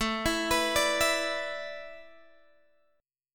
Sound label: A Suspended 2nd Suspended 4th, Guitar, Open G